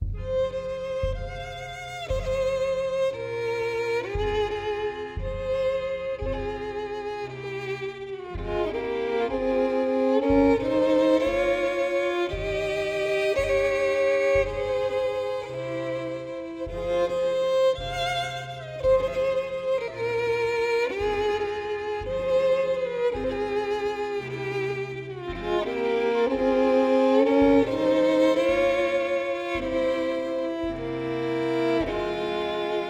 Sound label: violin